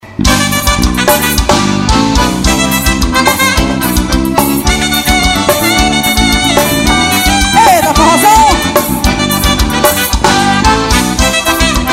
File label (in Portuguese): acordeon banda; quixaba pe